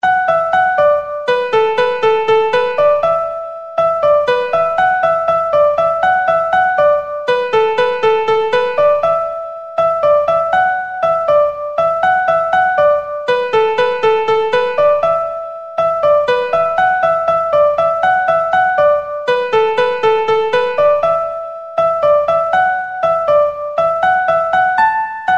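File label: Jig, Traditional Irish Music; Cooleys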